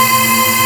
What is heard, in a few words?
Aud Wall C6, Xtreme Lead; Multisamples; Digital Sound Factory Xtreme Lead